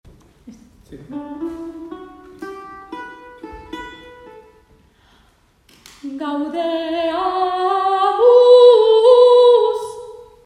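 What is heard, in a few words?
Soprano Tenor; Gaudeamus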